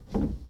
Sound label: Foosball Forward Rotate